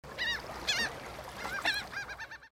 gull; Age of Empires III; cinematics

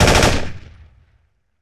AK-47 short burst; Weapons & Firearms; Sony Pictures Sound Effects Series

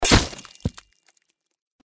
Violence; Age of Empires III; cinematics

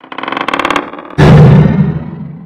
Down Gate

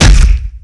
Mega Kick, Hand To Hand Combat; Sony Pictures Sound Effects Series

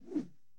Arm Whoosh, Hand To Hand Combat; Sony Pictures Sound Effects Series

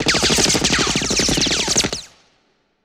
Sub-Machine Gun Ricos Multiple; Sony Pictures Sound Effects Series; Ricochets - Hits - Whiz Bys

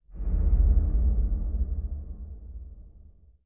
Sub Sonic Stinger; Title Sequence Effects; Sony Pictures Sound Effects Series

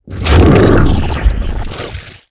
Morphing Liquid Robotics, Sci-Fi; Sony Pictures Sound Effects Series Volumes 1-10; Sony Creative Software

